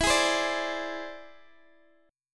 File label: E Augmented 7th E down Guitar Open D